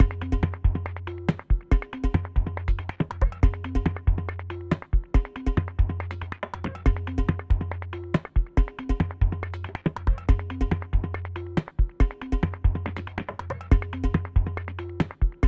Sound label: puzzle action loop layer drums; freeflight